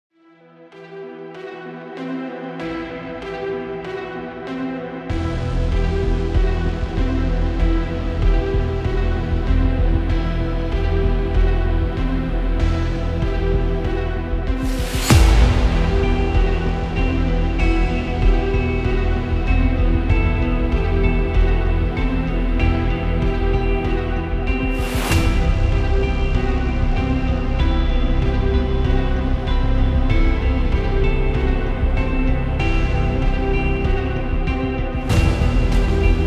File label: suspense background music dark tension music for crime mystery and thriller